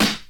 smooth hip hop steel snare drum sample a sharp key